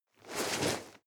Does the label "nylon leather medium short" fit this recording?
Yes